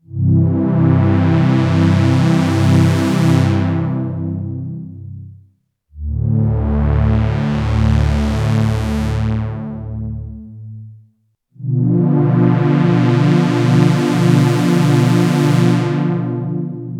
Modal Argon8

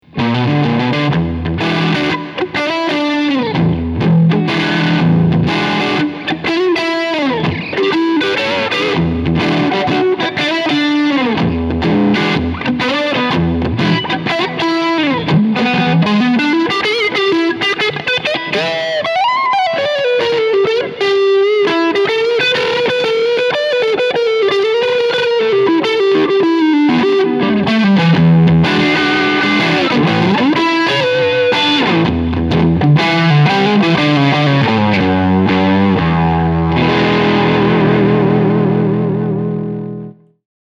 Full Gain

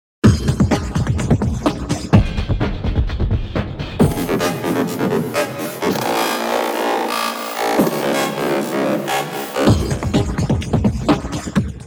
Granular Loop; Absynth

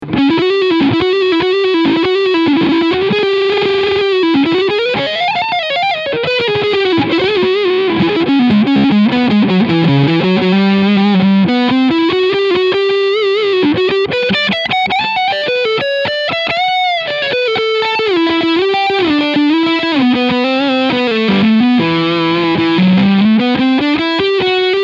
Framus Artist Line Overdrive Neck Pickup; Phil X G

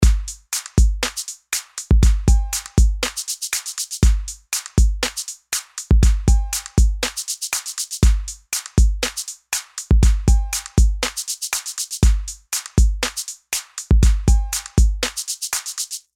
Clapper

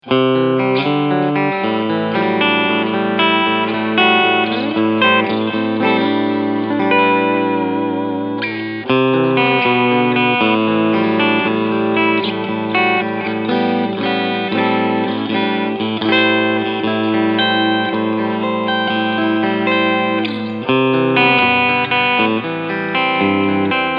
Clean Position 4 Switch 2

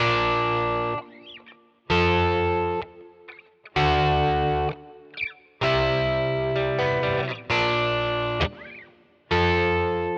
Simplifier DLX Plate Reverb

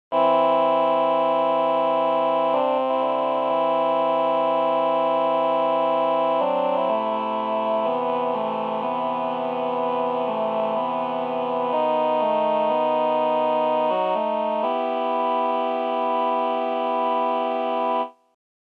Male4 Female4 Vplus

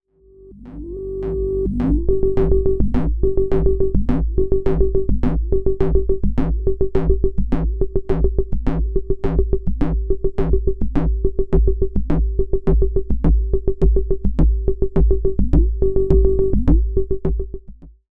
Leploop V3 Loop

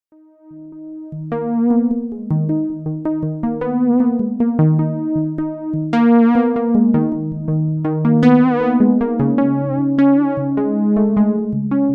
dw8000 live solo

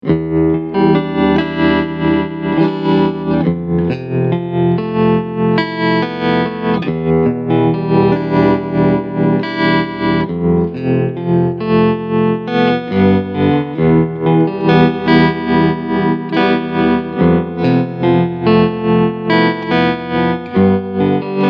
medium speed full shape

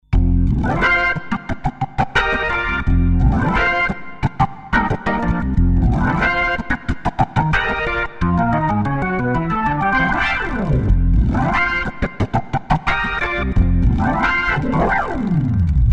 Crumar Mojo Classic; Max Tempia

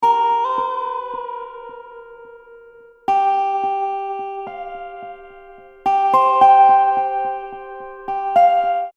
Dobro

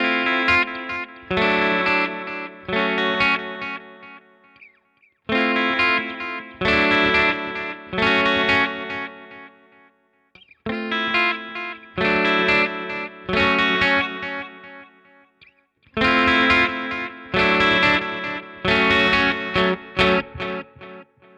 Neural DSP Quad Cortex Effects Tape Delay

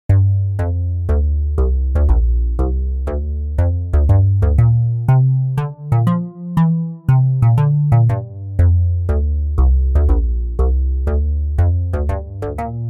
Walking Azure Bass D; BLAU

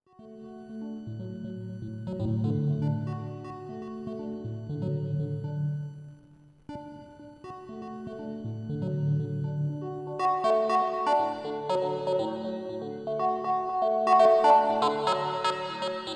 Preenfm3 FM Synth bandpass Reverb

